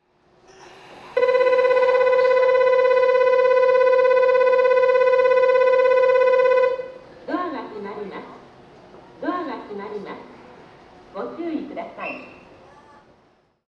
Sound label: kyobashi bell; 京橋駅; 1番線発車促進ベル; jrwest; gakkentoshi